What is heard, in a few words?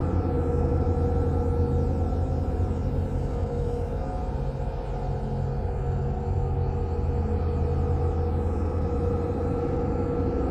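Security Hologram, ambience nexus tower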